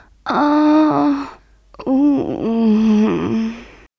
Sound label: eat cake female